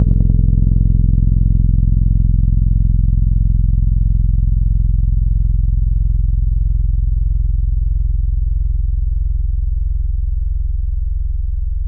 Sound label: Keys Electric Piano; Synthetic's Serum Collection; ESW X Keys